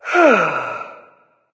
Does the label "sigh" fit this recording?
Yes